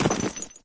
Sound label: player snow; Source Engine; dod